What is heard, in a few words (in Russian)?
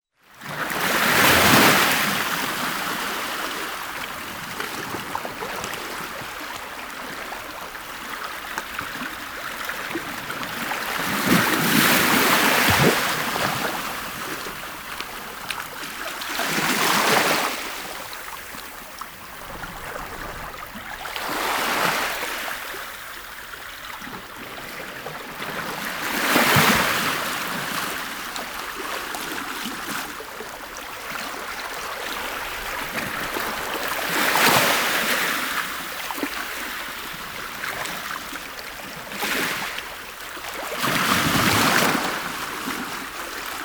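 звук морского прибоя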